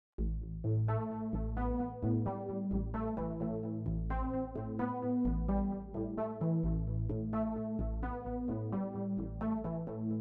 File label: Synths